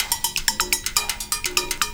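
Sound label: TIMBALE LOOP, Techno Trance; E-MU Formula 4000 Series Vol. 2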